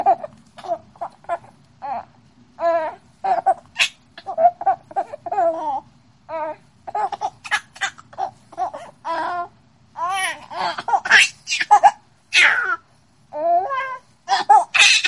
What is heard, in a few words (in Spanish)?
risa super tierna